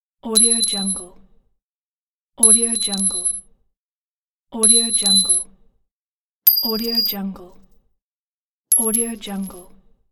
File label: small metal object dropping